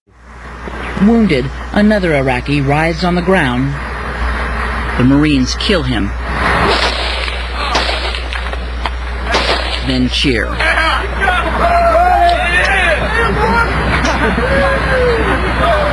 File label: shoot